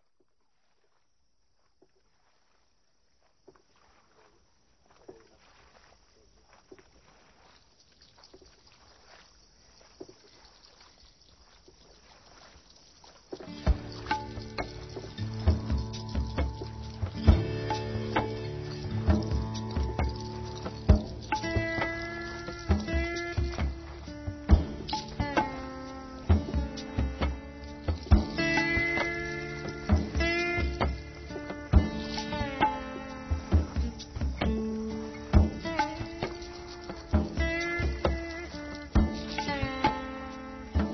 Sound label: Fusion Music Raga; RKC Live Stream; Travel India